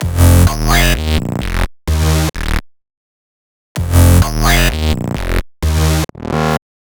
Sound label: 128BPM Cuts; Verve; Vengeance Samples; Verve Kit